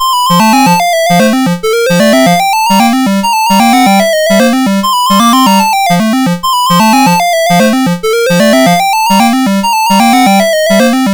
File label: Mexican Dance Tune, MOS6581R4